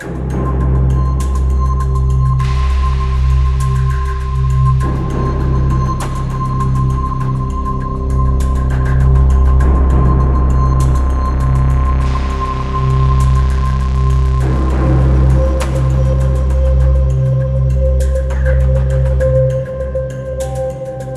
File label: morse